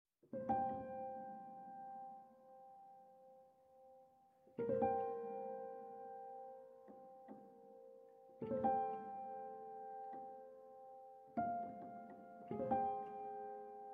Performed on Piano

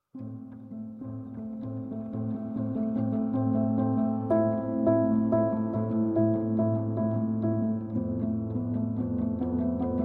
Performed on Piano

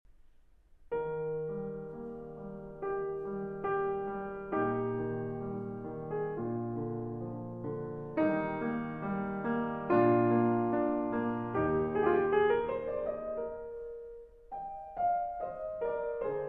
Piano Sonatas in C Minor Adagio

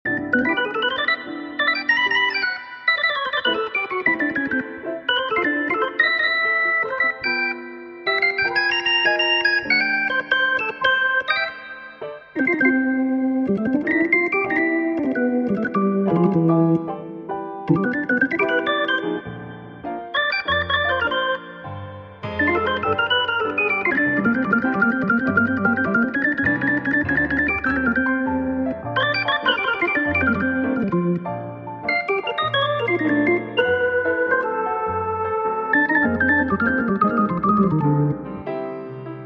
Instrumental Soft Jazz

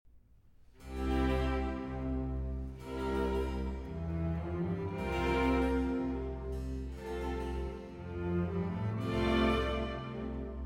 Violin Concerto No. 1 in A Minor II. Andante; J.S. Bach; BWV 1041

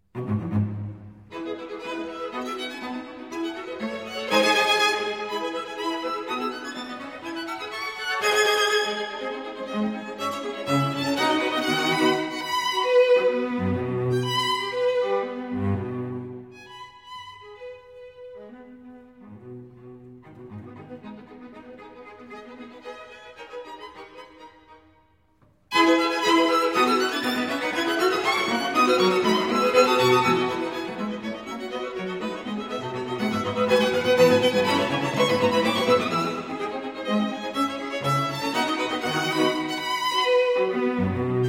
String Quartet No. 14 in C-Sharp Minor; Op. 131; Beethoven

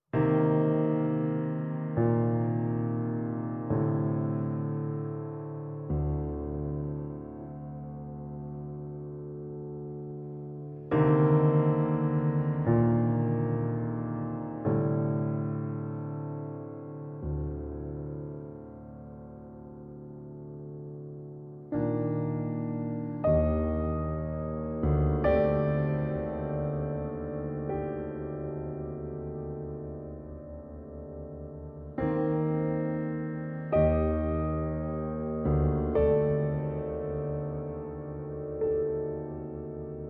Performed on Piano

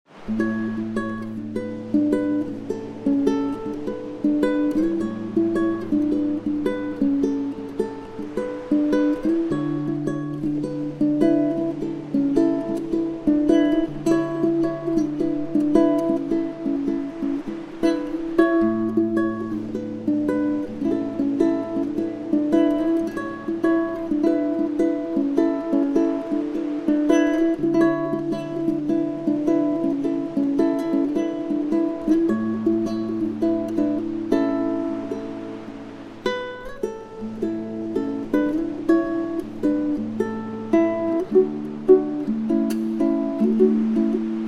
Work Cafe Ukulele BGM

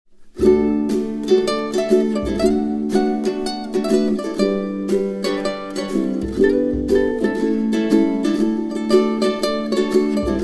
Healing Relaxing BGM Ukulele Summer Beach

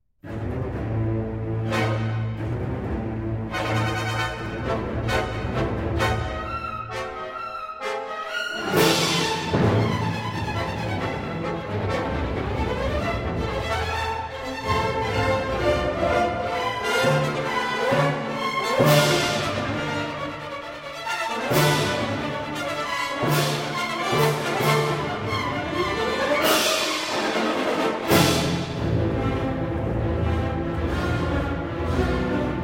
Symphony No. 5 in C Sharp Minor; Mahler; II. Stürmisch Bewegt

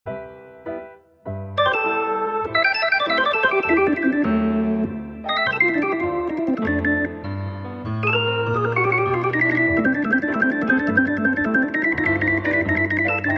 Soft Romantic Jazz

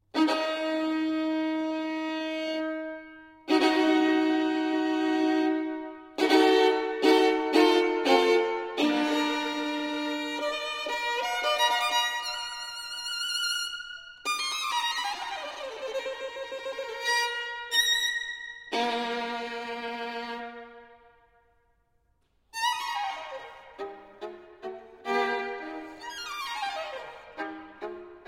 Caprices For Solo Violin, No. 17 in E-Flat Major

